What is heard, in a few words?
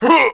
jump; PC Gamer IT CD 41; Daikatana; superfly